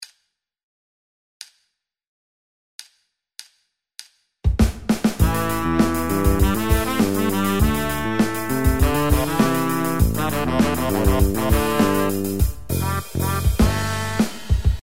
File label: pop